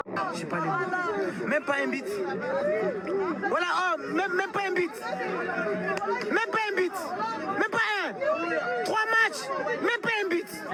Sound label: bite; cdi